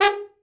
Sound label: trombone; Best Service; Killer Horns